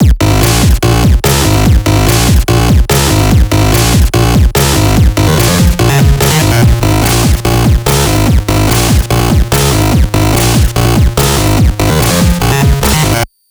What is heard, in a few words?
8bit